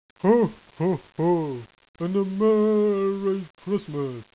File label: hohoho; cstrike; xmas assault